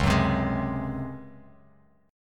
C sharp Major 7th Suspended 4th C sharp Bass Standard; C#M7sus4 strummed; JGuitar